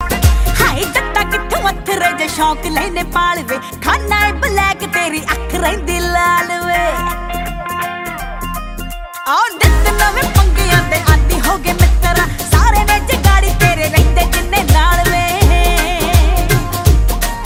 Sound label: Punjabi Beats Ringtone